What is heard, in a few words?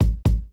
kick; recorded from hise sampler and macos finder